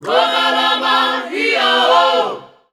Go Kalama Hi-a-ho F 120, Voices Of Africa Sung Phrases